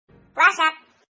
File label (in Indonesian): Nada Pesan Wa Lucu Whatsapp Notification